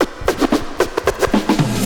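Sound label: scratch, record; XP RECORD-FX; Best Service; XXL Production Effects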